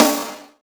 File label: snare, Roland R-70